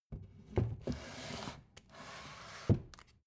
book shelve soft

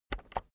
keyboard external enter press slow

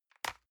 envelope drop multiple medium

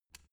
switch turn on soft

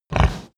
pig grunt close

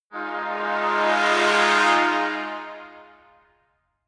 trombone crescendo short flutter

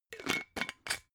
glass bottle massive close

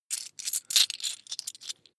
coins big search

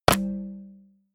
medieval click medium neutral

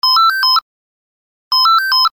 european phone

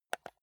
console controller home press

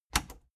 plastic button latch scratch enable fast

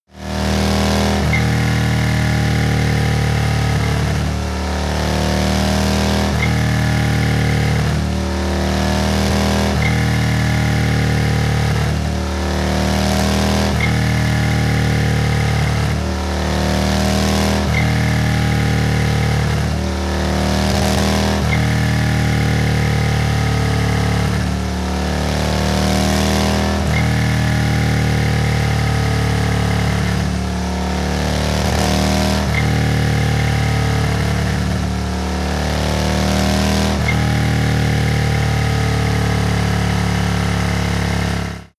motorcycle harley sequences short thrusts

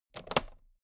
toolbox latch close regular

